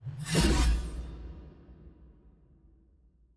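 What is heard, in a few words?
guillotine whoosh; ambience room torture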